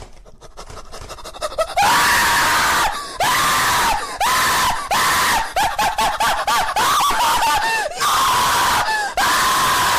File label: scream